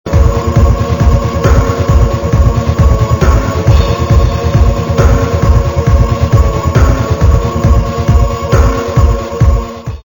music

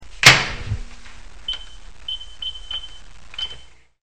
MICROWV

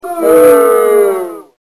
BOO

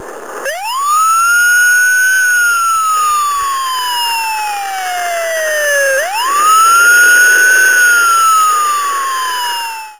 Police Siren

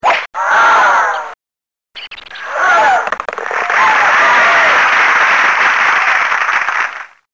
Golf

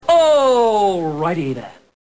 clap

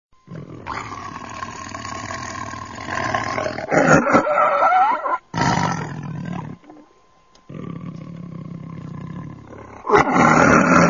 Tiger Growl

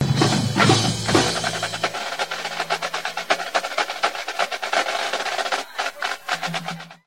snare break

